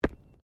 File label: Body movement Organic Impact Light